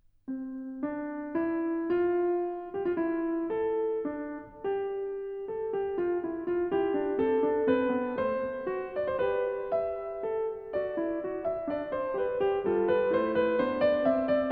Fuge C-Dur; BWV 846; J.S. Bach; Das Wohltemperierte Klavier Book 1

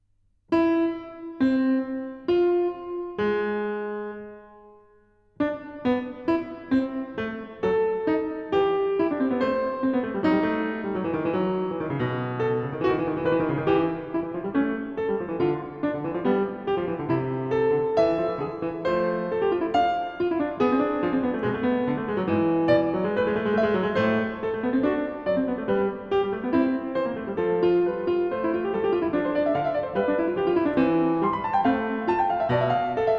Fuge a-Moll; BWV 889; J.S. Bach; Das Wohltemperierte Klavier Book 2

